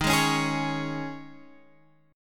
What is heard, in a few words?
Eb 7th Suspended 4th, Guitar, Half Step Down; Eb7sus4